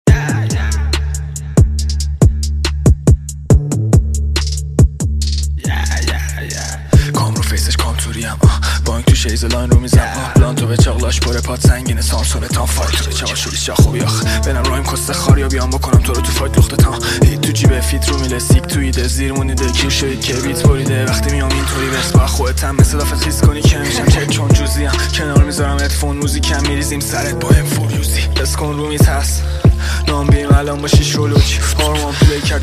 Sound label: Outro